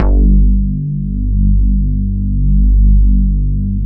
SYNBASS; Synthesizers; Soli-Music; World of Synthesizers VOL-1

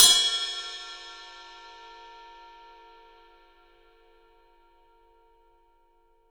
ride cymbal; 3D Sound; 3D Drums

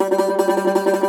cumbus saz G, plucked strings; E-MU Producer Series Vol. 7 – Old World Instruments

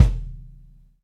XV Kick, Roland XV-5080

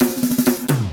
Fills 128BPM, Clubsounds

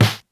SD Classic Lo, Snare; Lost Tapes From Mars

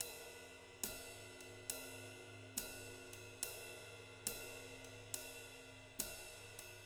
JZ70 SWING, Living Drums

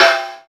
SNARE, Akai MPC2500

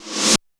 Drum Machines, Korg 01W; 300 Drum Machines; TotalKit

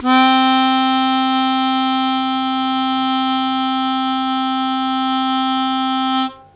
Long Sustain C1, Harmonica; Zero G - Harmonica

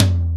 Low Dry Tom, EMU Proteus-2000

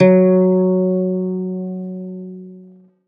ZITHER, Alpine Volksmusik; Best Service

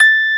DREAM BOX A4; MSC BOX

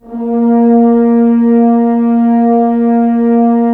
Horns; Roland - LA Composer vol. 4; HZ Horns

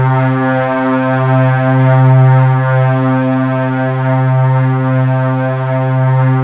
WS SWELL C3, ENSONIQ VFX; Rubber Chicken Software Sample Bank

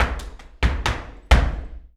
Flamenco, ZAPATEADOSOL

